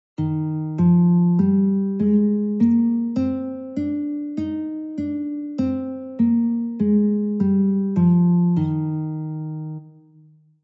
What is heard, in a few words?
Eb Scale Guitar; California Music Conservatory